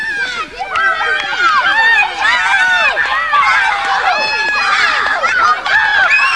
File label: kids; Sound effects